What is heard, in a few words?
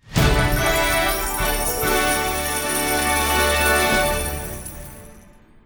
Mega win; loki Wild Tiles Desktop; Ingenuity Gaming